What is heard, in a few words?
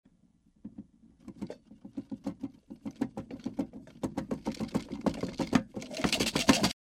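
metal objects sway and fall from a table or shelf; Звук землетрясения в доме; Звук землетрясения в доме, когда трясется мебель, падает посуда с полок, звуки скрипа, гул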